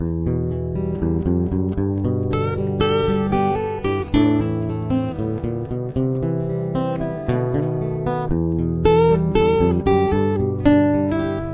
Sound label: full chorus, Guitar 1, Guitar 2 ( lead ), both parts sound like together